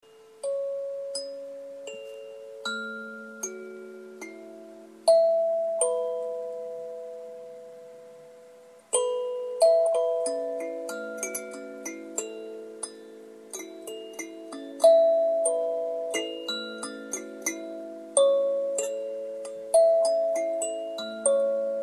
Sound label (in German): Sansula Deluxe A Dur Pent